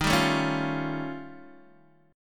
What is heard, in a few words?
Eb 9th Flat 5th, Guitar, Open D